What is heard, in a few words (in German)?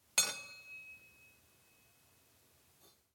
Metallstueck